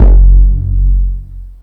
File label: KICK -L, Disco House; USB Soundscan vol.20 - Fresh Disco House I